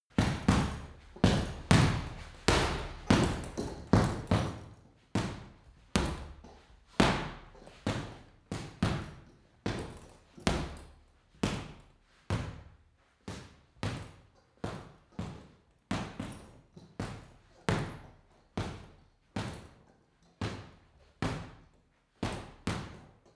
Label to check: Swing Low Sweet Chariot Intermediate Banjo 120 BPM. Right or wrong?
Wrong